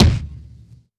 Vinyl Kick, drum

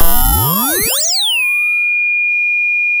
sound of a bike bell

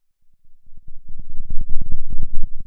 Sound of a thunderstorm, center

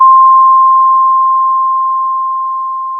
Sound of a school bell

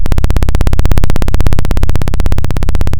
Sound of a plane taking off

